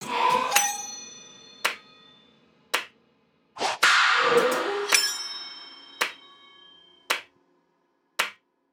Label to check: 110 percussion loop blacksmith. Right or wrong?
Right